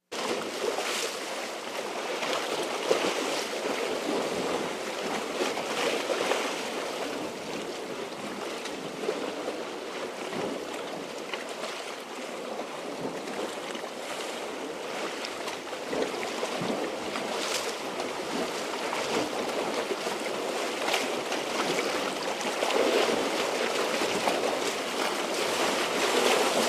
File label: Lht Surf Churning, waves & surf